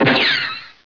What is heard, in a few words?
raiser bomb